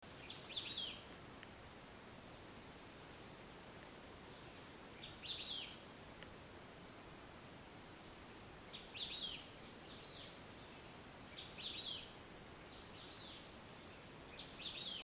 vireo latimeri florida puerto rico; West Indian

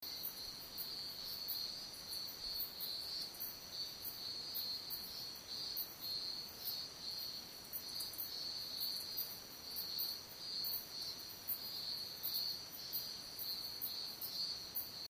nesonotus mount scenery saba; West Indian

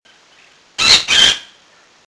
Aratinga nana Windsor Jamaica; westindian

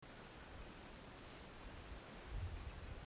coereba flaveola portoricensis; florida puerto rico; West Indian

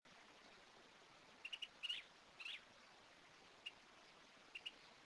Myiarchus barbirostris Windsor Jamaica; West Indian

